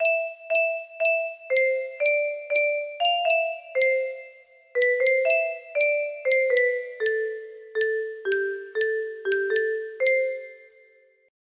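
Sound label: music box melody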